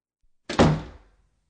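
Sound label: closing door; Zakryvanie dveri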